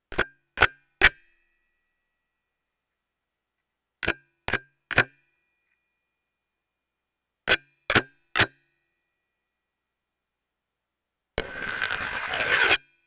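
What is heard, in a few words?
thumb plastic nickel excitation stereo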